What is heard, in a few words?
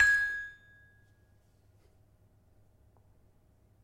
Peking, gamelan